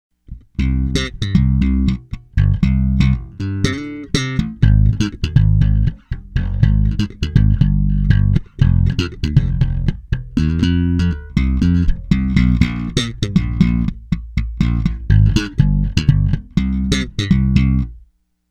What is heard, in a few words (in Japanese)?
Bartolini電池新品ver, ベース; 電池を新品に変えたver; EQはすべてセンターでアクティブで録ってある。; バルトリーニ